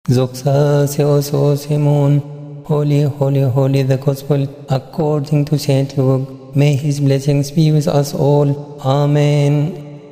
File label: Gospel; Tasb7aEn